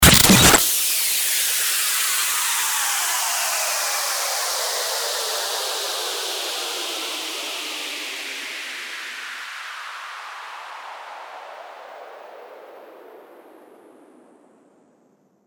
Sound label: STARTER WHOOSH